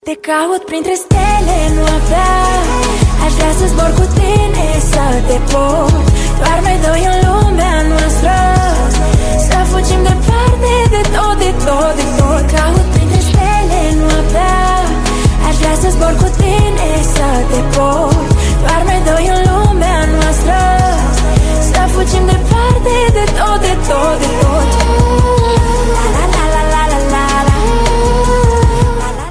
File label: ringtone